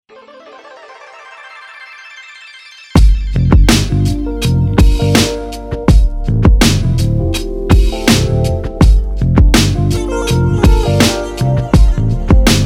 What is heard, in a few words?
trap; sonic dope trap vol 2; flare